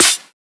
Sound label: Open Hat; FLPRO